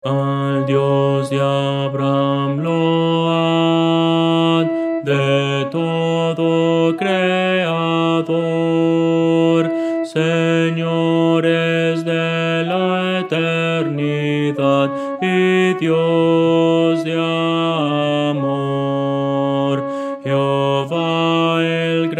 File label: contralto